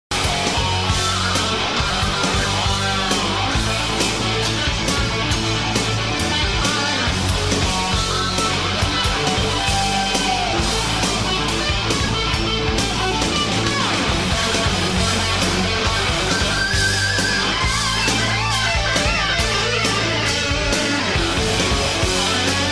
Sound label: super guitar solo